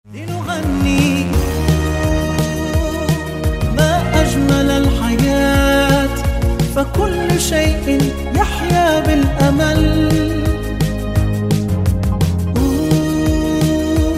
arabic version

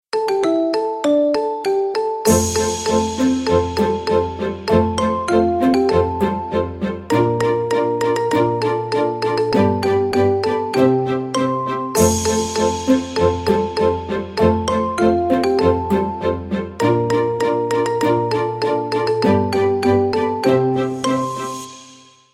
marimba jingle bells marimba remix

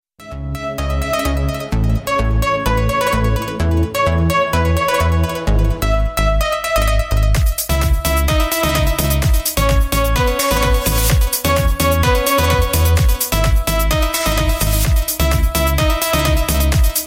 golden accordion

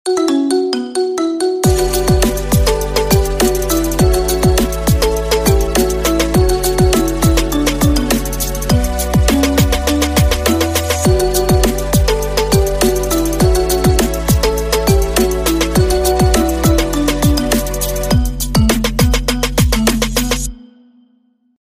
marimba remix

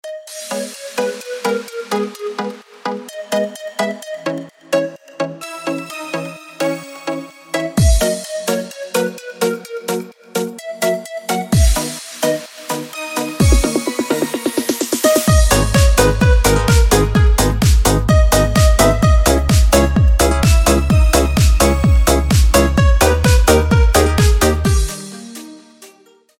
instrumental

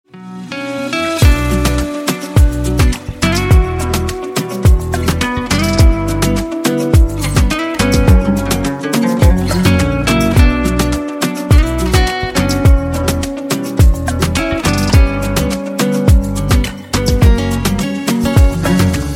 instrumental

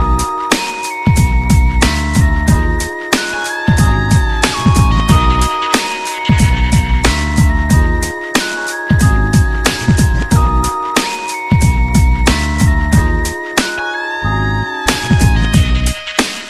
instrumental

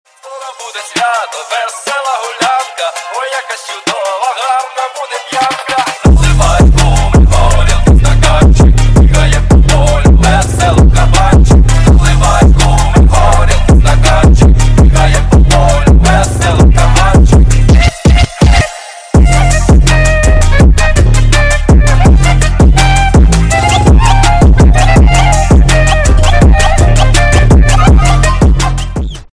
trap remix

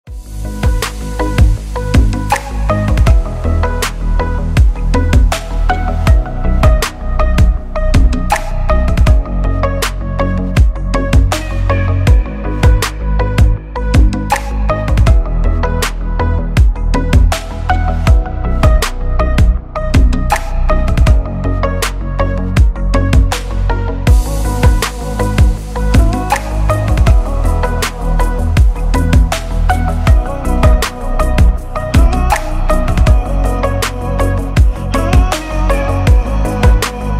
instrumental

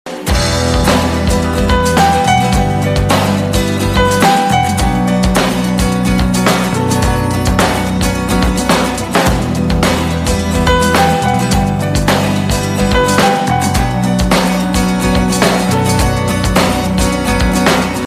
instrumental

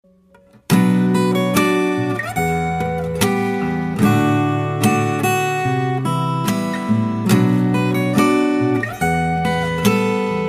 acoustic version

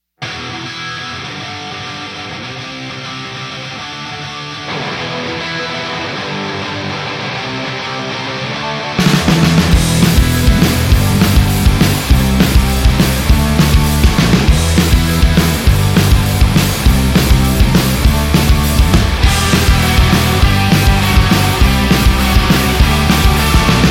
death metal intro

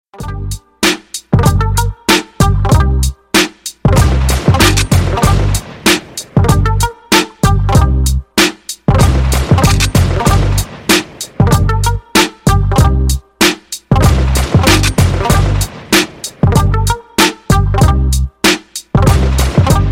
instrumental

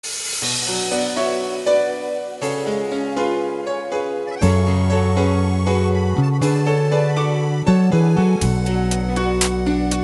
instrumental

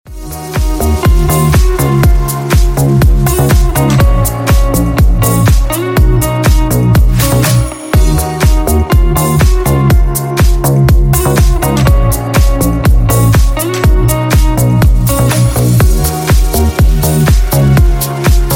instrumental mix

